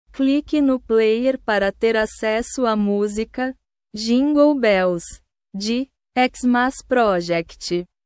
jingle bells

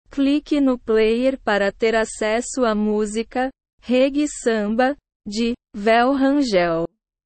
reggae samba

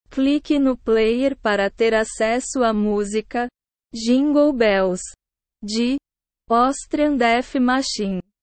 jingle bells

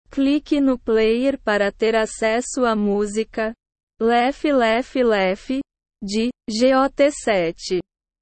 laugh laugh laugh

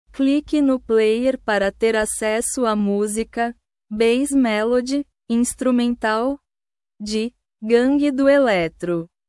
bass melody instrumental

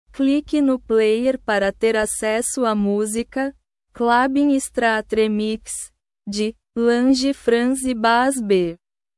clubbin straatremix

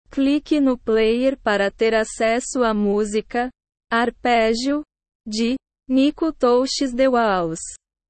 arpegio

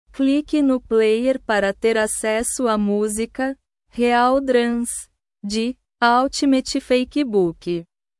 real drums

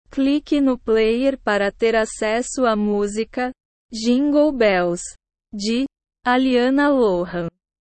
jingle bells

